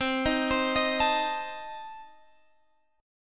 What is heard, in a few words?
C Minor 6th, C, Guitar, Open G; down; chord shape